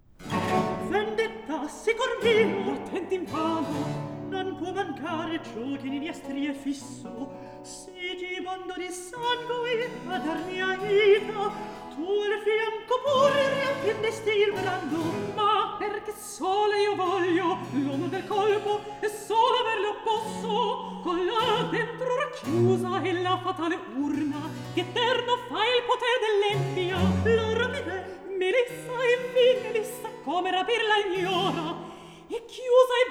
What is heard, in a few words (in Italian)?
Recitativo; Ruggiero, Bradamante; Antonio Vivaldi (1678 - 1741); Vivaldi - Orlando furioso (2004); Atto III